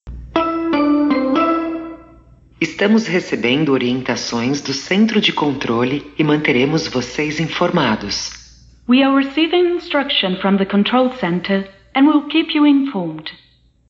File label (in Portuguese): aviso sonoro, metro paulista